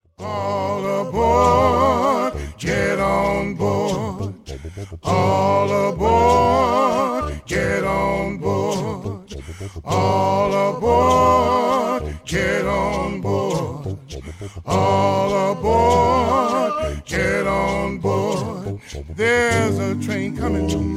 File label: Train Song Melody